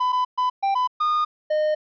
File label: song